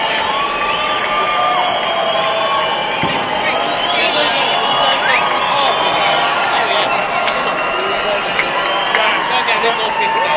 applause

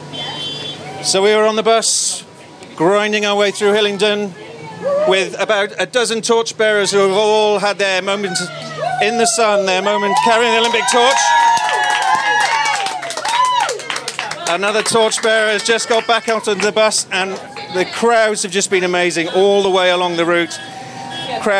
torch relay bus; audioboo

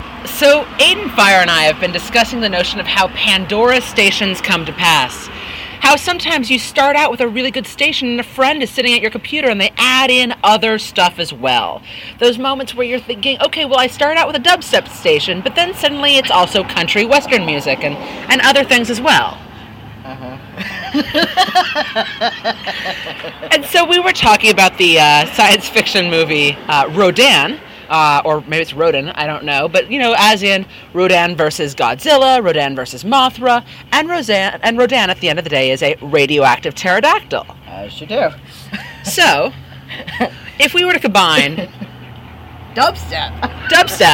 dubstep; pandora station

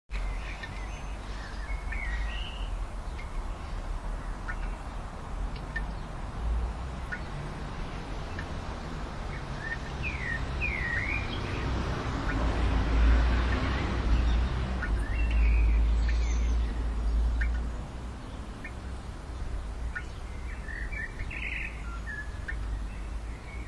drainpipe and birdsong

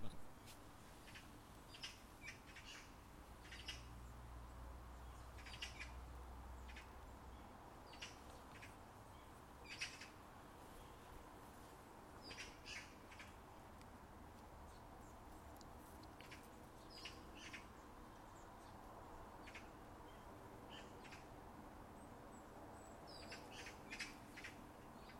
short evening garden soundscape; recorded with Zoom iQ5

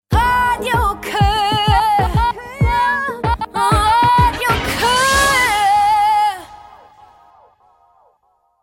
radio jingle; radio koge; produceret af lydstudiet aros

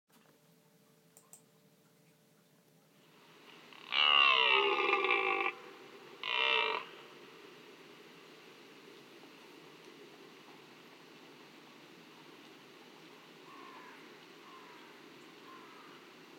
red deer sounds

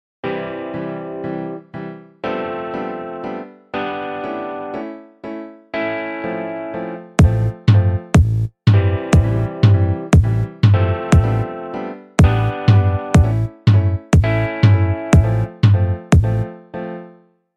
Garage Band song

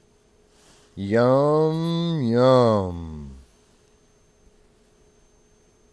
yummmmmmm

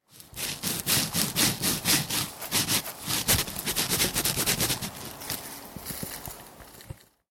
leaf rub

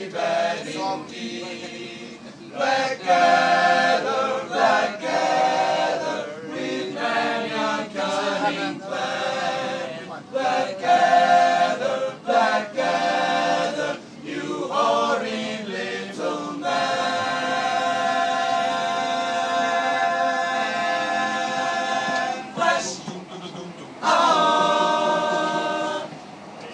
close harmony group singing on train; blackadder theme on train back to london from elstree

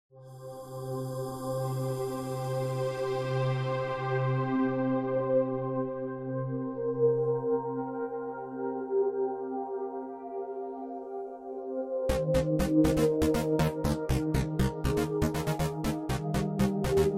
drone

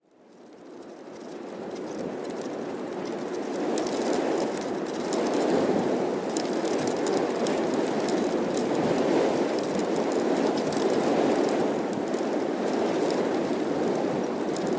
horned poppies rattling in the wind

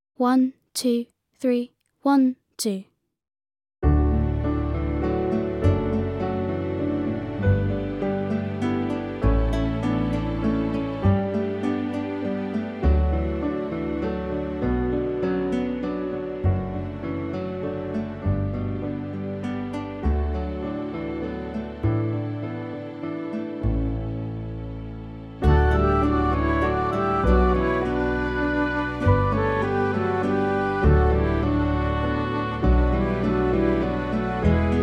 backing track

